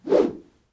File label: fire; smite; hades